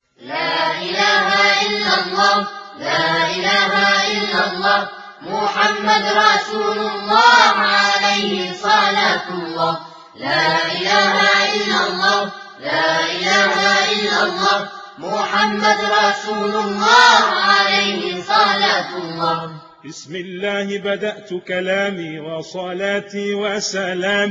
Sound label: Islamic Nasheed - La Illaha Ilallah - Arabic